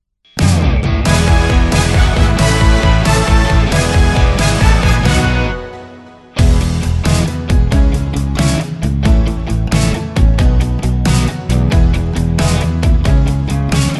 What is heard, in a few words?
karaoke; Rugby